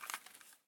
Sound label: weap handoff; Escape from Tarkov